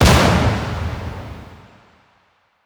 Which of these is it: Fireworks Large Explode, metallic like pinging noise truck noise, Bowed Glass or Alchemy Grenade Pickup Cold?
Fireworks Large Explode